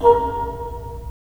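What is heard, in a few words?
wind bassoon; LA Composer vol. 3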